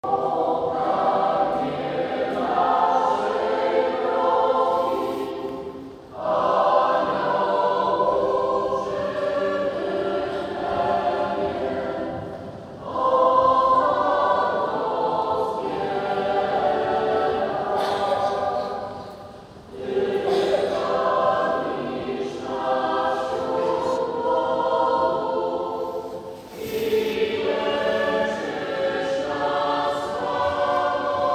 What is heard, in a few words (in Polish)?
śpiewa Chór Parafii; Tymbark